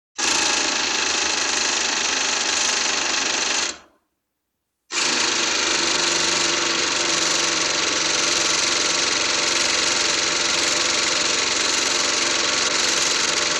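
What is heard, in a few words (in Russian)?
Шуруповёрт режим дрели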